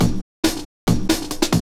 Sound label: drum loops; bb drum loops